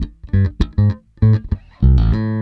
Electric Bass; Basses

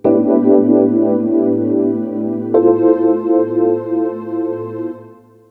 Electric Piano, Loops, Keys

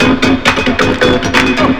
LOOP; SoundFactory; DRUMLOOP

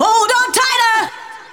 vocals; SoundFactory; SMPMANIA

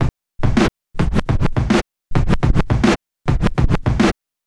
Turntables

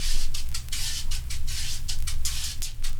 Acoustic Percussion

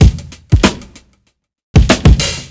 Old School Break, Drums

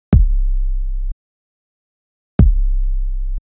808 kik; pitchshifter PSI

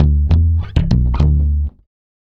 Chapman Stick, Basses, Loops

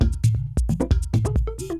Dance Drumloop; Drums; Dance Beats

